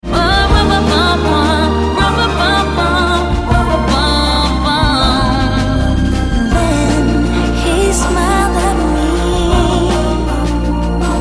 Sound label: little drummer